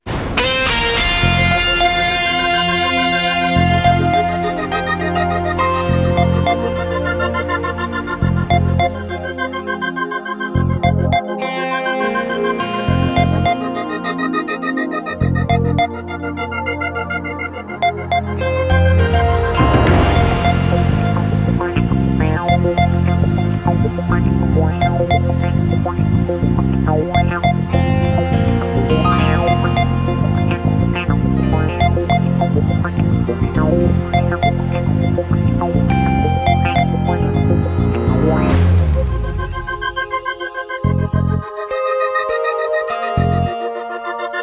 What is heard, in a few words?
on hold norhythmix, jingles